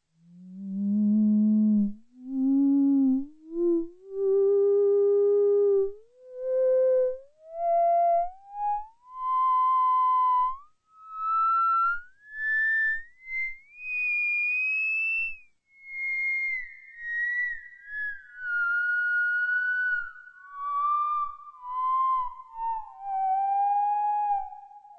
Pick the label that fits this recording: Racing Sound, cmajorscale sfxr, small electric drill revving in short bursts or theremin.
theremin